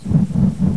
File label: big fan